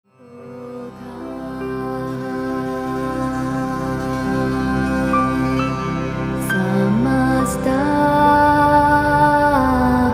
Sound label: Sanskrit Prayer for Peace